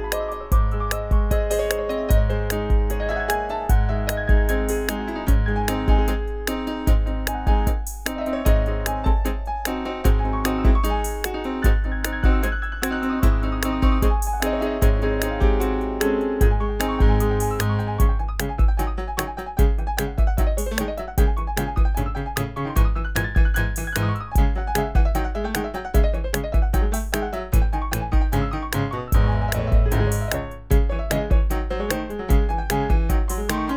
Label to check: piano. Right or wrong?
Right